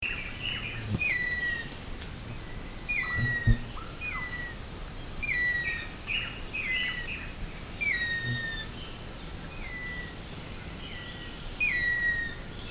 canto do capitao castanho

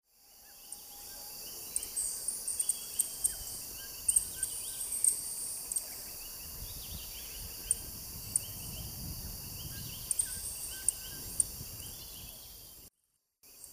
canto do beija flor de bico virado

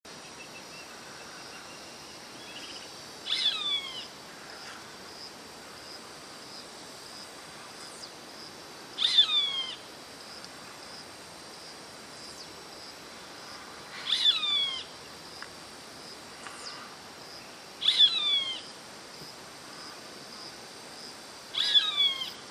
canto do pintassilgo

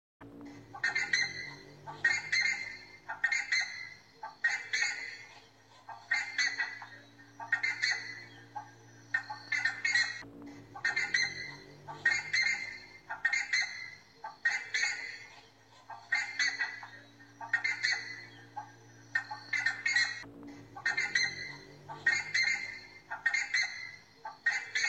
Som de Guara vermelho Barulho de Guara vermelho Canto de Guara vermelho

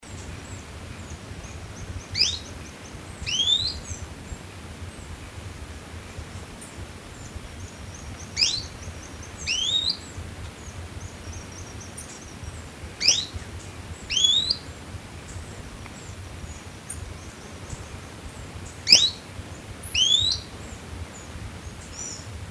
canto da estrelinha preta

